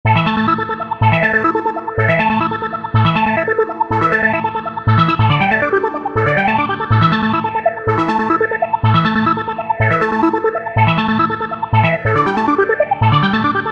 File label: Phaser; rave generator bundle